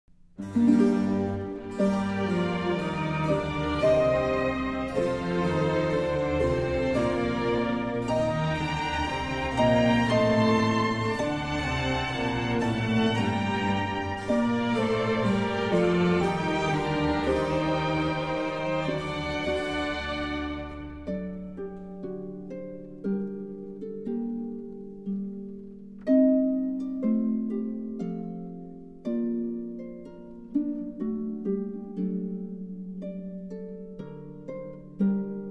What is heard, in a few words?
Harp and Orchestra; II. Larghetto; Organ Concerto in B-Flat Major, HWV 294